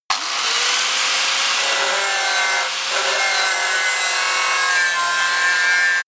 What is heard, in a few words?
grinder deep on load